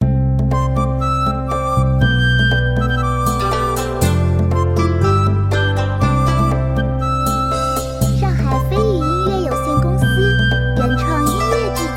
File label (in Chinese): 轻松 欢快 中国风